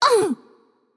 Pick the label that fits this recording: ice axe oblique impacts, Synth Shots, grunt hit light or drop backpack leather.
grunt hit light